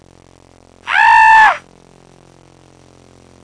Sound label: SCREAM; Sound Library 2000; Wayzata Technology; People